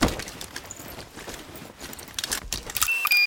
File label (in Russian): natsrojka radiostancii v pohode